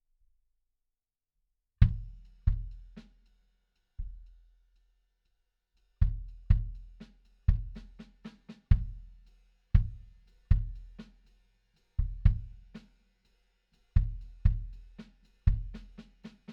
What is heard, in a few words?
BD In